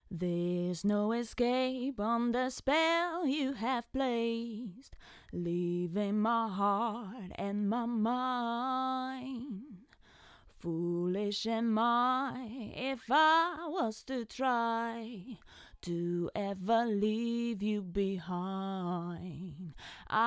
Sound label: VOC; Sontronics; Apollo 2; mono